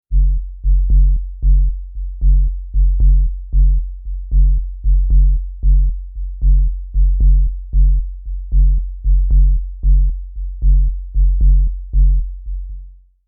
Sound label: Moog DFAM Subbass